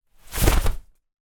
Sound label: household umbrella large open